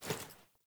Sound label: Foley Action Default Jump Land, Foley Default Clothing; Characters; Player